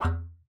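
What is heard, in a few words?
E3, bass flute; Xsample VOL-2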